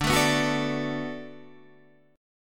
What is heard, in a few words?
D 6th D down, chord